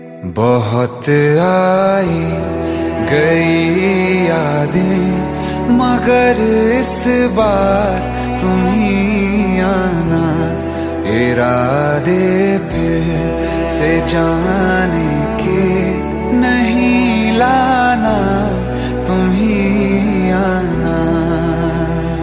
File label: Song Ringtone